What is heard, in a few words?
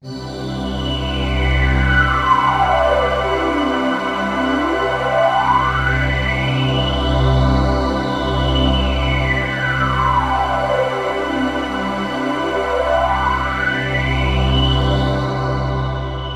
polypad stereo polyphase